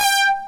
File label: analog stab; Pro-Rec - Synthsonic; TRINITY